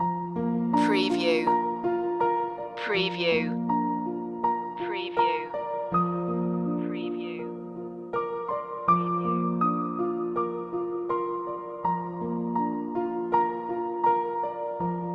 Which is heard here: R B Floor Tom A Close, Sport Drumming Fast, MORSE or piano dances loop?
piano dances loop